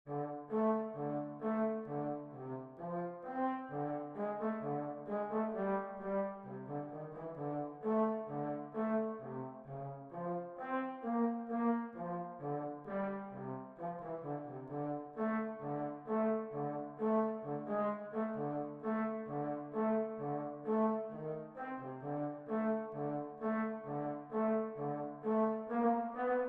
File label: Trombone 2